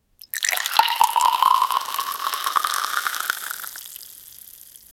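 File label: juice being poured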